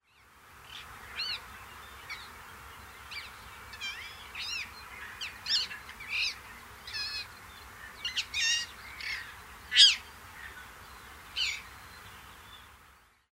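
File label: ring necked parakeet nocmig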